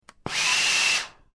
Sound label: Iron Steam